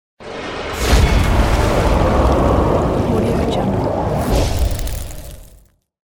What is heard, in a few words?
fire spell; infinity-sfx-productions